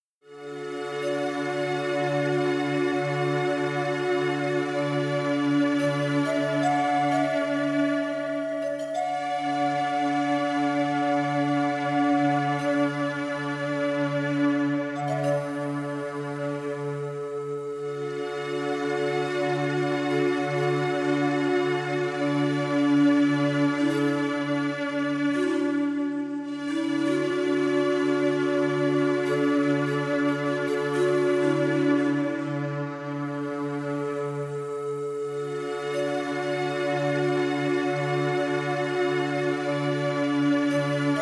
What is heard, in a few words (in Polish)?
lesny chor 432 hz